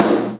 french, snares real

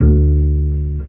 upright deep, Bass

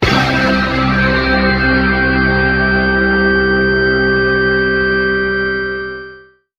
Stabs

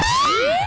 aero, zaps and beeps

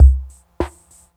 Boombap drum loop

